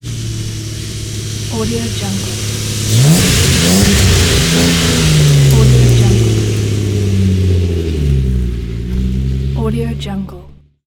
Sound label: rally car slow passby medium revving